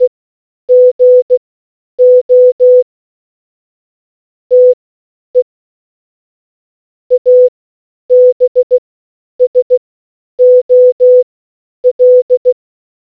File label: morsecode